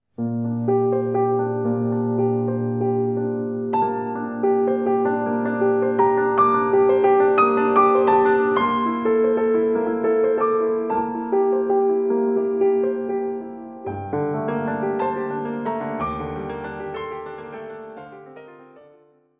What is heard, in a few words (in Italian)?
Preludi Per Pianoforte; Dieci Preludi